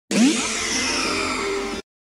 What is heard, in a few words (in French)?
Cri Ailes de l'Aurore; USUL; Catégorie:Cri Pokémon (Ultra-Soleil et Ultra-Lune); Catégorie:Cri de Necrozma